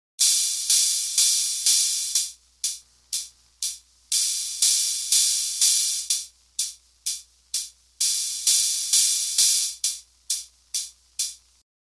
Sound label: open trigs, dr110